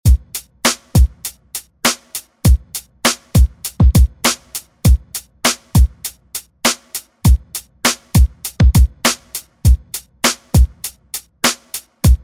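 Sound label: Funky Reggaeton Redrum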